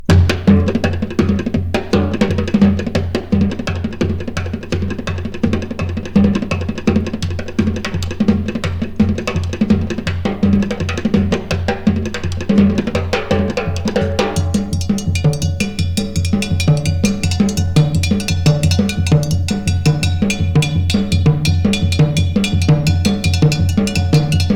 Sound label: Fast Latin Drum Rythms